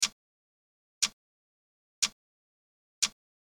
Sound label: Ticktock